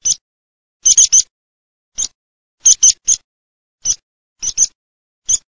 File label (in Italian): PISPOLA, birdsound